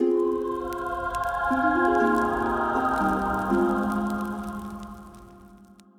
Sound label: splash; Sea of Lies: Nemesis Collector's Edition